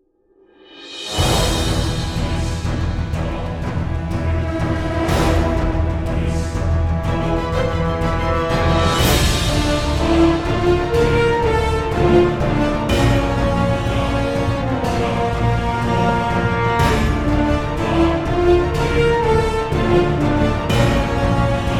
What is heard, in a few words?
Music Battle; Metamor M Battle1; Bloodmasque